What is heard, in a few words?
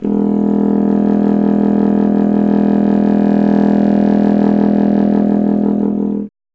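wind contrabassoon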